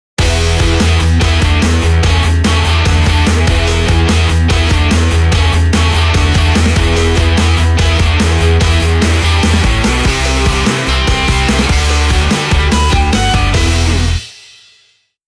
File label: Duel, ringtones; Nokia; 3600 slide